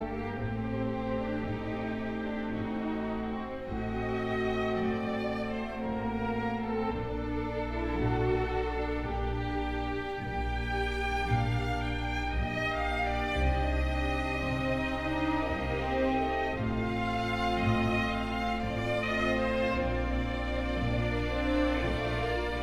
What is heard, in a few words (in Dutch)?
Adagio g minor voor Orgel en Strijkers